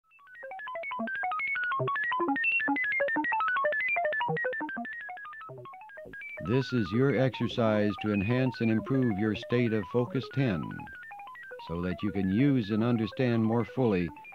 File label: gate